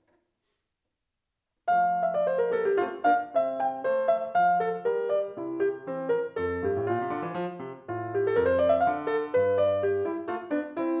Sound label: suite en fa courante, piano; haendel